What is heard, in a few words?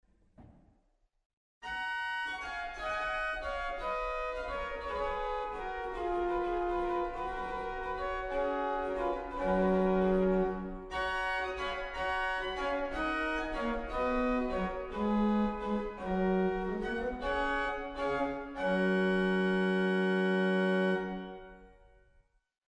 Salcional4; waltershausen